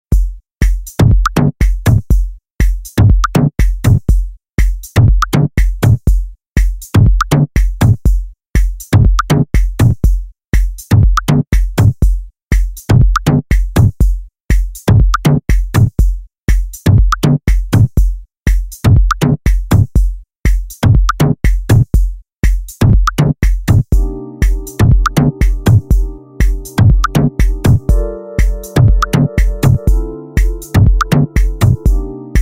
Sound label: Sax Dub Mix